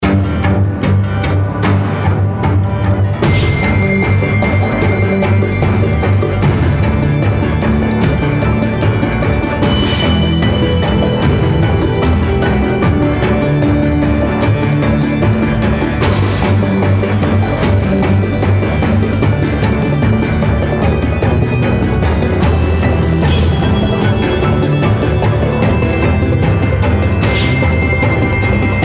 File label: Action loop